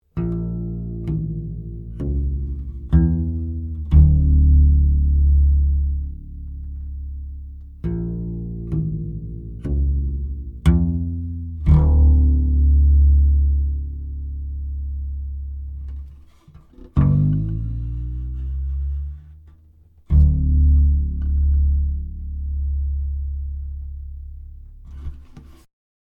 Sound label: guitarron